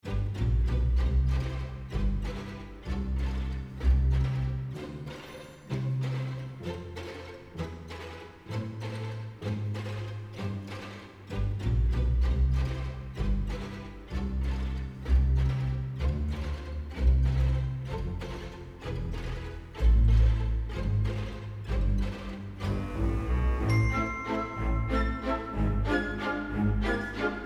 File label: Holiday Waltz